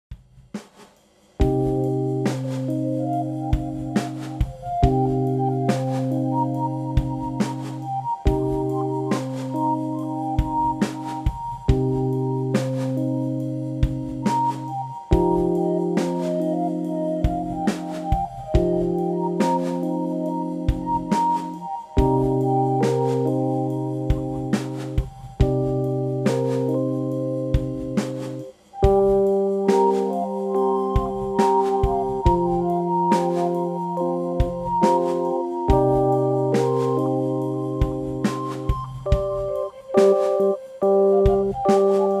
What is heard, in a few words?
Instrumental